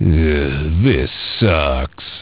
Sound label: ringout; DUKE